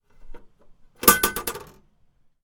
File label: Mail Slot Shutting